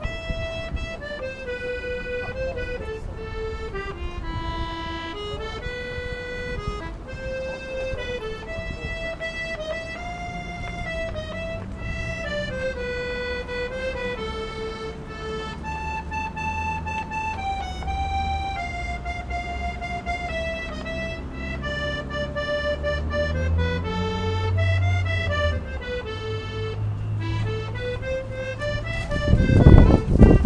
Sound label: rather young accordion busker in town centre; Sutton Coldfield